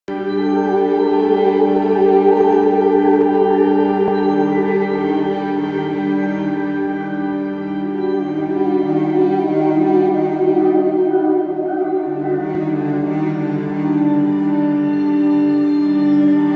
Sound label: Waiting Room Cue, Music; Stingers